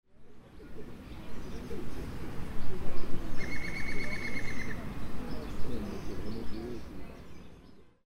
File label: iberian green woodpecker